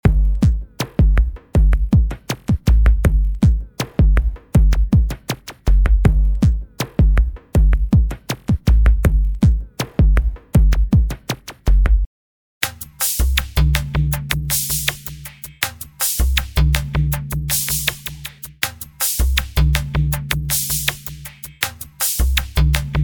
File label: Ambient Electronica